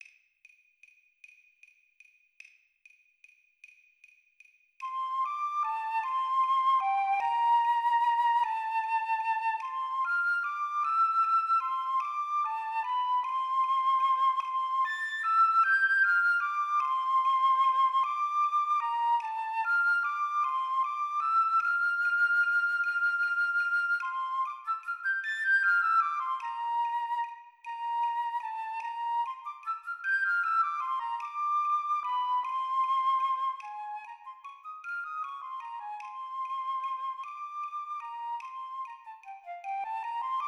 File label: Flute Play Along 50 BPM